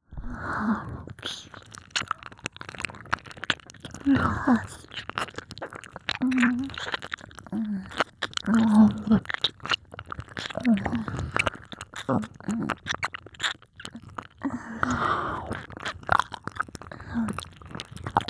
両耳同時耳奥舐め, ASMR; 脳バグ

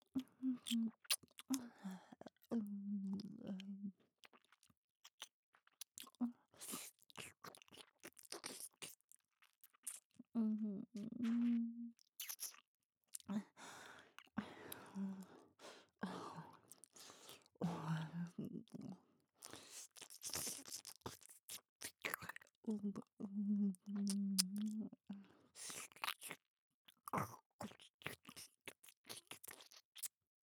キス; 【マゾハーレム】

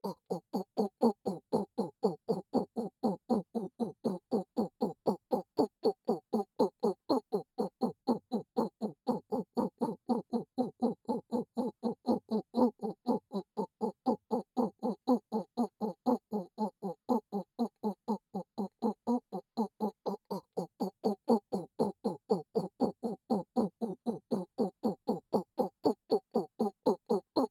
リズムオホ声; ミオ; 双子ダウナーメイドにオホ声で支配される残酷なオナニーサポート

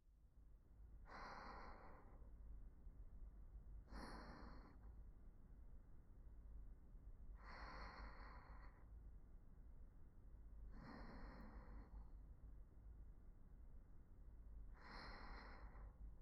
寝息 右側

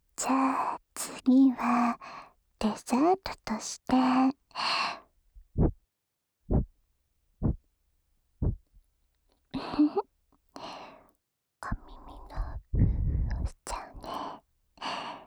耳ふーふー右; 後日談

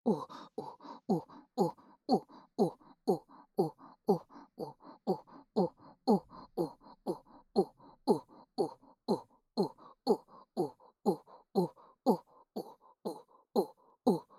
リズムオホ声; ミオ; 双子ダウナーメイドにオホ声で支配される残酷なオナニーサポート

